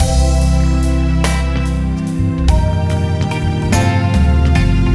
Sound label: Pop, ringtones; Google; NewWave Labs